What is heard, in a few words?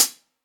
tick; osu